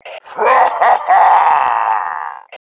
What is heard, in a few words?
WOOHOO